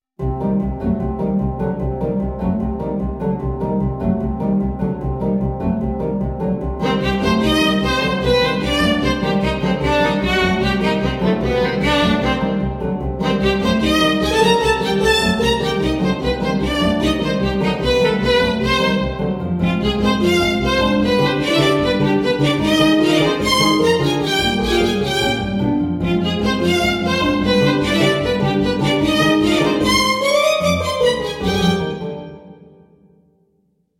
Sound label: Violin; Undertale